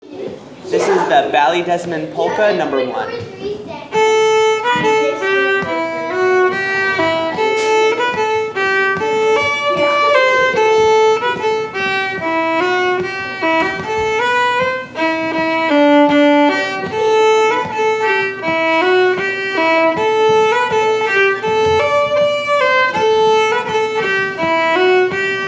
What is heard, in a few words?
Fiddle Ballydesmond